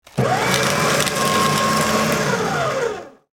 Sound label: paper shredder